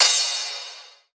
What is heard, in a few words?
cymbal dusty; Drum One Shots; SD MIDNIGHT Sample Pack; Slate; Cymbals